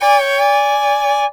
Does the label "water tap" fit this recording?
No